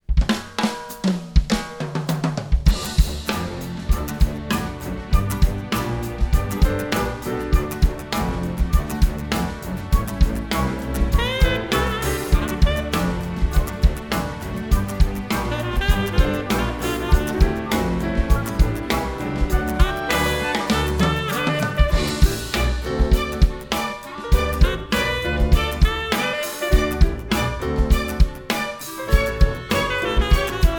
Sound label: Motown Orchestra